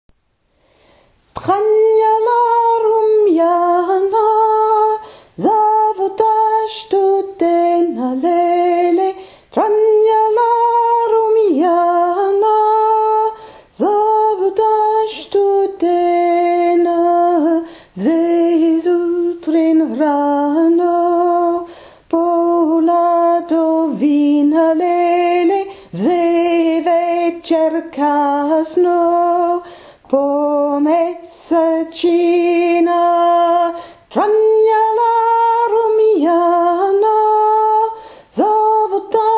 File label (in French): tragnala roumyana alto lent